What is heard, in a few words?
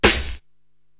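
snare drum, Drum Machines, Korg TR-Rack; 300 Drum Machines; Scab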